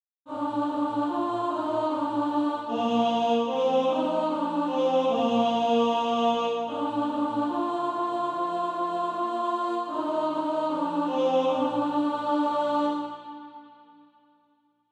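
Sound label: alto, Practise Music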